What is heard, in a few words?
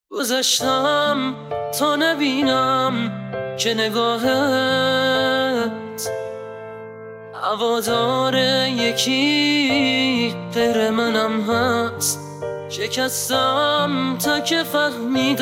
Piano Version